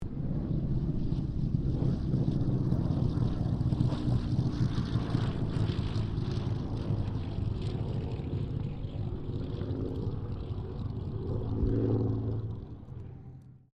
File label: AIRCRAFT SUPERMARINE SPITFIRE MK-XVI LAND; Airplanes